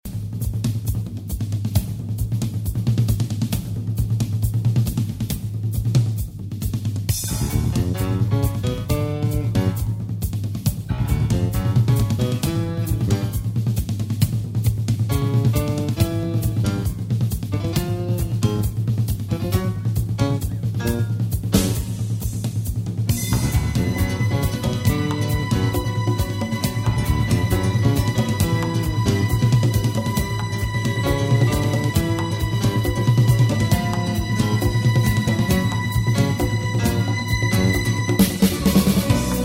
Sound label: Instrumental; Shahrzad